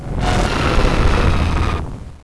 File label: ball attack; XDM